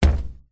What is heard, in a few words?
footstep single flip flop on metal external platform floor